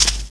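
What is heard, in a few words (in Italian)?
maracas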